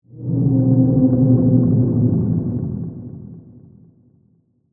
Sea Creature Moan, Horror, Under, Water, Whale, Song; Monster; BlastWave